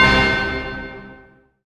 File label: synth glock, dance synth; Sound & Vision - Gigapack I CD 2 (Roland)